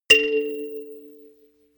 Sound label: notification kalimba rattle fifth